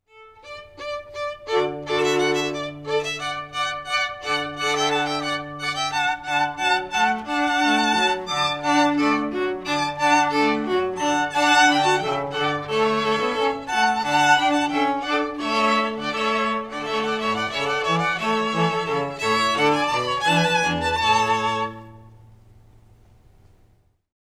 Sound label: La Rejouissance from Royal Fireworks, string quartet